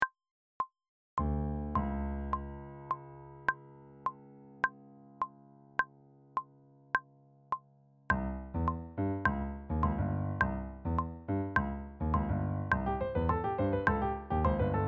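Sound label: cuban at tempo trumpet 3 and tuba; band resources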